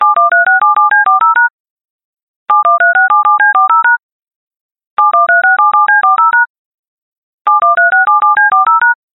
phoning; electric

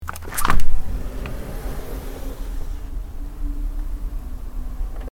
large glass window

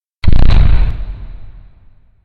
giant footstep; monster

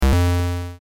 coin

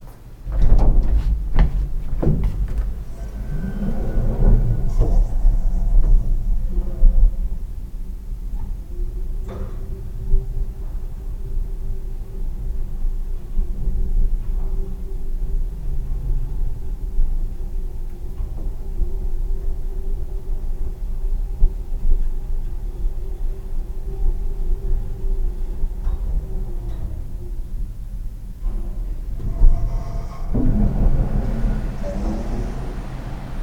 elevator up

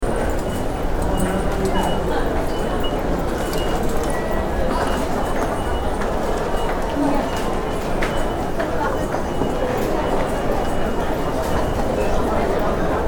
Shinjuku station

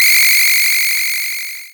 beam; arms

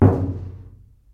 knock on washer; knocking

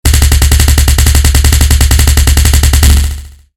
punch; attack

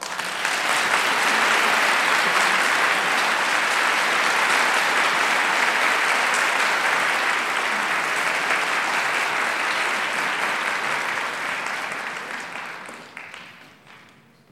clap in hall

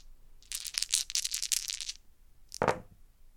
dice

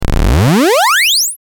jump

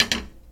putting a frying pan

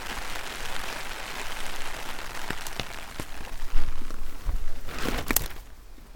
plastic umbrella rain

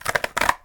opening a paper box; eating

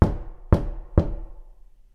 knock on door; knocking

